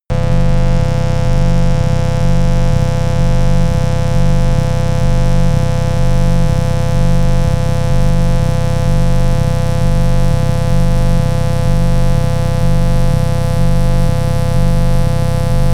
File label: atmosphere erratic static